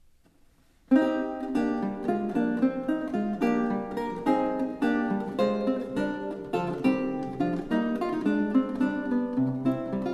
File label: Galliard (lute)